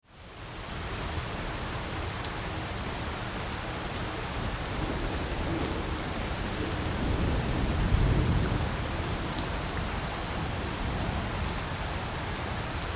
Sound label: Weather Thunderstorm Rain Light Thunder Ambience